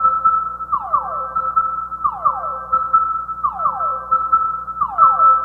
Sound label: Sonar FX; Sonic Foundry (Sony Creative Software) - Stylus Pressure Urban Grooves on Digital Wax; Matrix Z